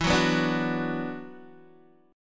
E 7th Suspended 2nd, down, Guitar, Open D

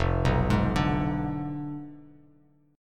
F Diminished 7th F down Bass Standard; Fdim7 arpeggiated; JGuitar

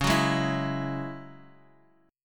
Ab 7th Suspended 4th Sharp 5th Db, Guitar

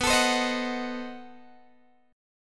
B 7th Flat 9th, down, Guitar, Open G

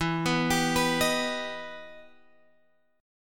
E Minor Major 7th, Guitar, Drop D; down

